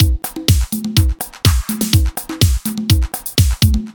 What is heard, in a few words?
Loop